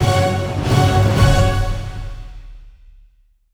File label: medium Win; netent